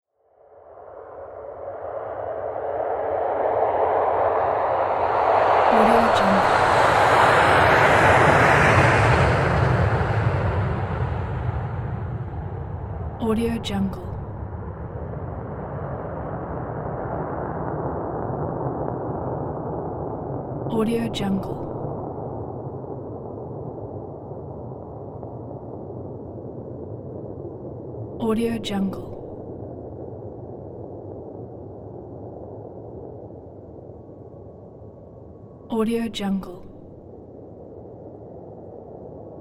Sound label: jet airplane take off; pure-noise